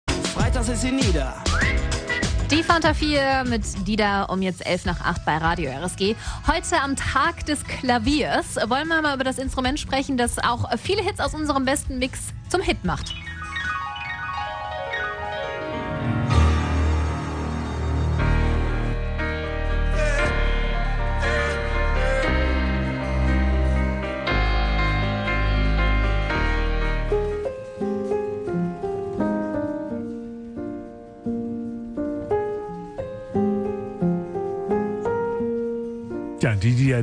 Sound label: piano; tag des klaviers